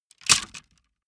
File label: wood sticks small break